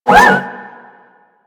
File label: Leg Servo; Warframe; machines; Jackal